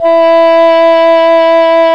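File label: E5 ENGLISH HORN; ORCH.COMP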